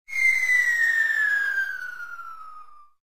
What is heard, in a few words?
Fall Whistle